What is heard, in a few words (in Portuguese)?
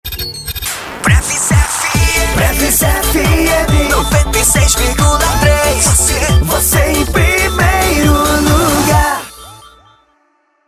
vinheta